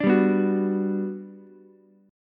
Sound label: G Major 7th, Ukulele; strummed; JGuitar